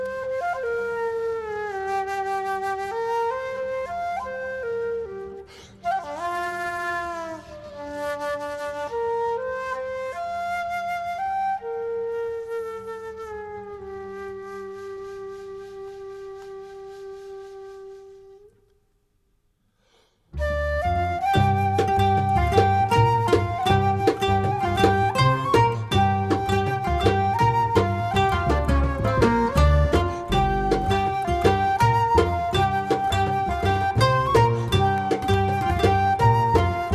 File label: birds drums